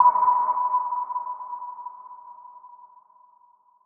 Sonar Submarine; One Shots; FX; Ableton Live 12 Lite; Core Library

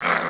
SMALL DOG; Canon CameraWindow

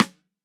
Rim Sidestick; One Shots; Drums

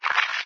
drink empty; There; ThereClient

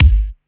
Kick Rerubbed Punch

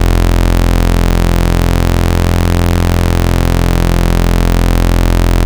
Saw Dual; Basics; Vector Sprite; Ableton Live 12 Lite; Sprites

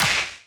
Clap, Drums; Ableton Live 12 Lite; Core Library

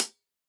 Hihat Closed

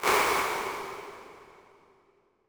windy plate; Convolution; IR Samples; Unusual

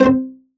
Strings Pizz Oct C3

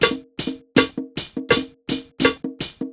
Tops Latin 3-4 123 bpm, Drums; Loops; Ableton Live 12 Lite; Core Library

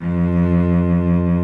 cello F2; Ableton Live 12 Lite; Max

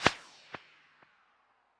Textures Tape Low Speed, impulse responses; Time 15; Ableton Live 12 Lite; Hybrid

